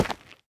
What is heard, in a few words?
Basalt hit; minecraft